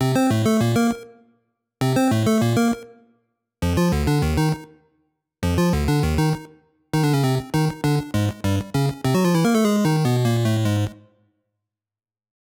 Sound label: Underground BGM; GBC